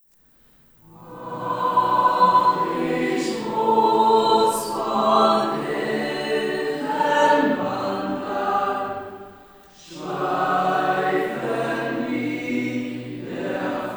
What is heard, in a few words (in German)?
Chor